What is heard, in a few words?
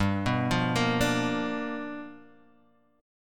G 7th Sharp 9th, Guitar Standard; G7#9 arpeggiated